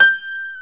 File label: PNO, Grand Pno, Roland; Club-50 - Foundations; Grand Pno 3